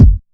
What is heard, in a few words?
warm kick drum one shot F sharp key